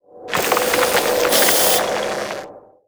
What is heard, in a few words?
gust; CosmicRage; activities planetoid